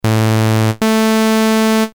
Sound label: saw